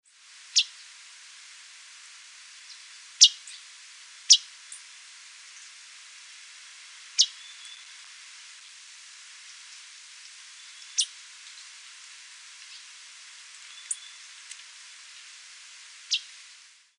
Red tanager

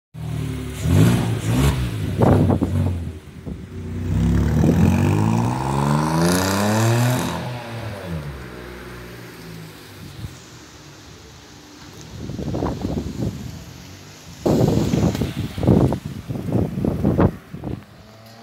Datsun GO; ماشین

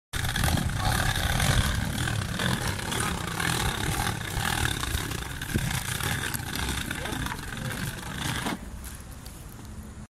Luggage wheels; چرخ های چمدان

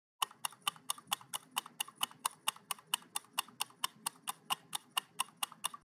to wait

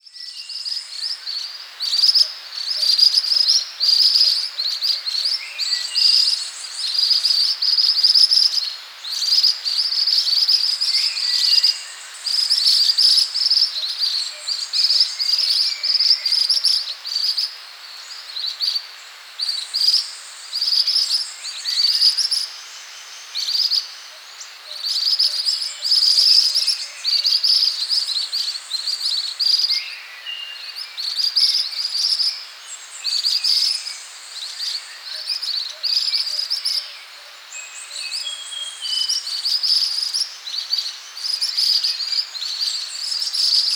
Pericrocotus divaricatus

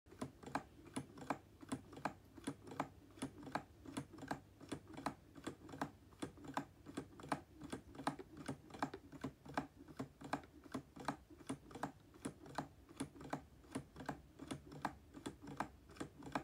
Pendulum Clock; پاندول ساعت

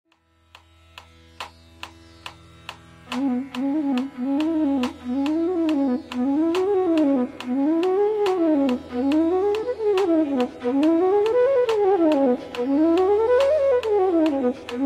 Bansuri; فلوت بامبو هندی

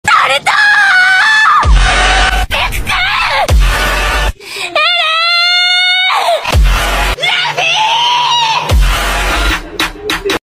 Anime screams; فریاد های انیمه ای

